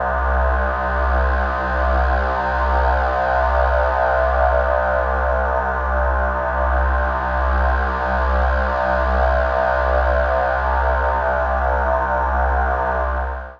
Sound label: Fat Analogue Drone C; Zero-G Ambiosis